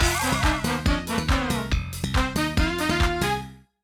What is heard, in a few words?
Failure; Balloon Bang